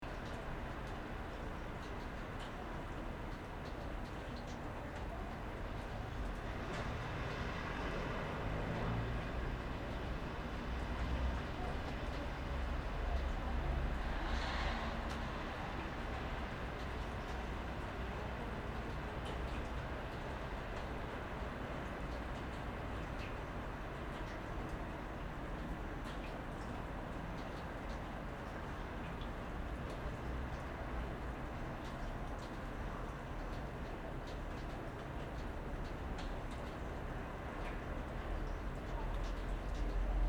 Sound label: traffic noise